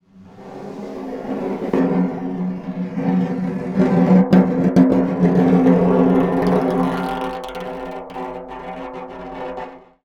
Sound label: Snare Drum; Percussion Samples